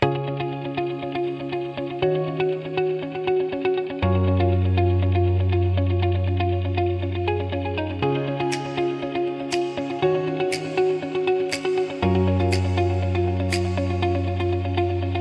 a motivational corporate score; path to excellence

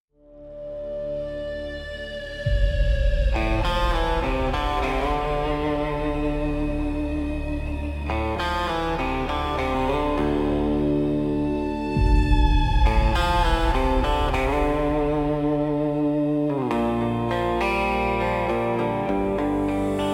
bullet dust engine a gritty country action; cyber-rock-western

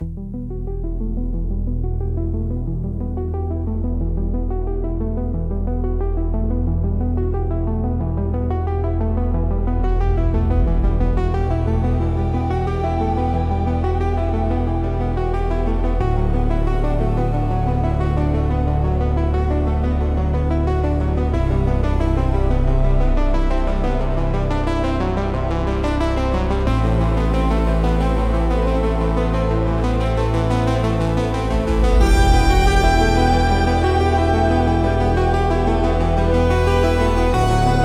a mysterious sci-fi ambience; echoes of andromeda

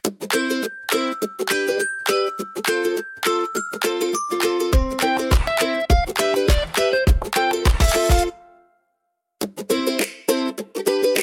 positive vibes only a uplifting pop soundtrack; pop-and-happy

